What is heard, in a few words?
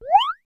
hud worldmap recap appear